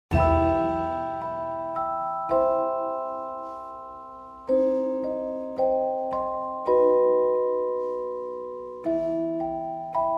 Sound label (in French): DECOMPTE 60 MINUTES ESCAPE GAME CHASSE AU TRÉSOR MUSIQUE; HARRY POTTER; MUSIQUE D AMBIANCE